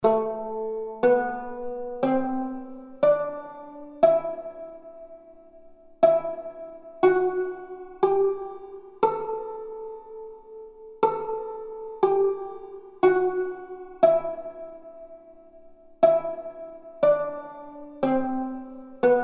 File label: tanbur hüseyni muhayyer